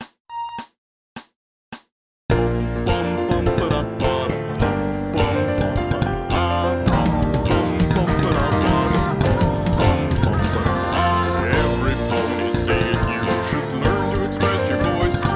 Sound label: Acoustic Remix